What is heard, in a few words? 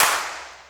CLAP, Dance Drums